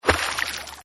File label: splash Out; kickbuttowski; mellowbrook